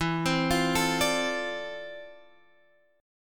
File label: D-Minor 7th E, chord; Dm7/E; arpeggiated